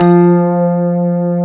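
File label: grand piano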